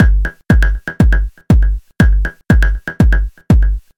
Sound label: Hard House